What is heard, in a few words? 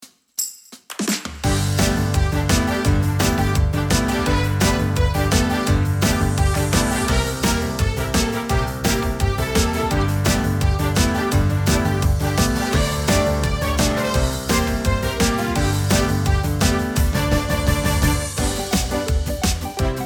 Instrumental